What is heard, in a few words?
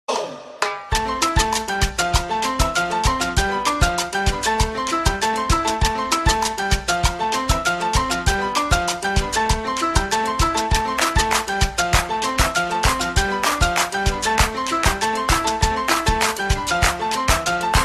Salsa